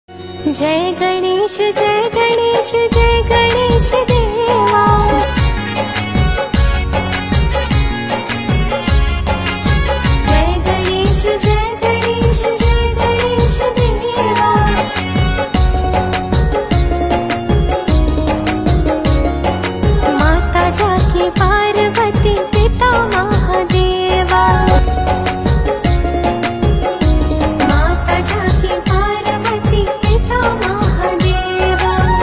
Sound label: ganapati puja special jai ganesh deva song, bhakti bhajan